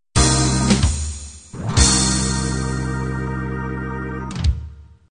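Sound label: pop 16 beat end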